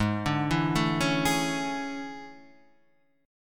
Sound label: E 13th G sharp